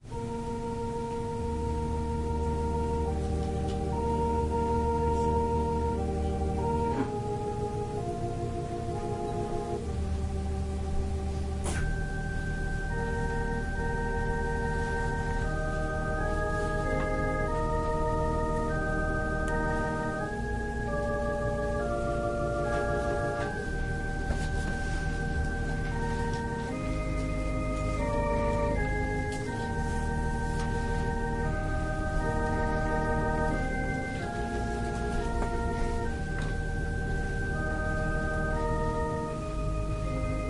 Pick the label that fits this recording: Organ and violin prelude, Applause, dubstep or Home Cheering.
Organ and violin prelude